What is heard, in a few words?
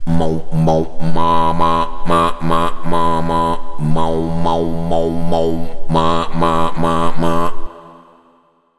Energy Flashing Chant